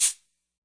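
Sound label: classic hip hop Shaker; Classic Hip Hop Mega Kit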